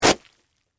Splat Wet Towel, Drop; Texture; BlastWave

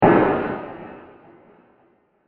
Metal Crash With Panning Delays; Burst; Impacts; CraftedSignals

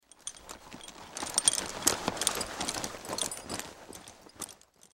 Horse Saddle Move, Horse Saddle Movement; Animal; Horse Sound Effects

